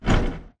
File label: bt footstep jog parts; Titanfall 2